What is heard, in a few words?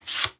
draw card from desk